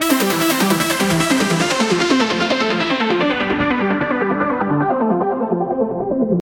Synth, saw, fast speed, falling arp, medium reverb, falling high-cut, C major; Audialab EDM Elements